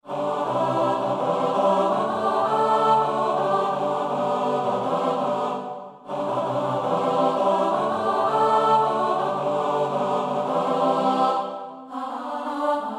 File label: Chorus